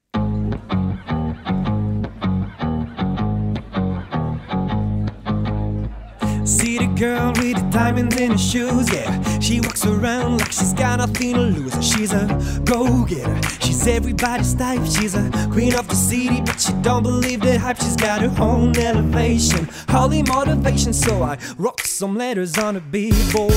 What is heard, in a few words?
English Singing voice